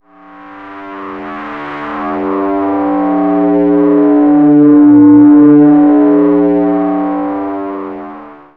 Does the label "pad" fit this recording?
Yes